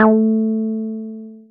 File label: Synths BASS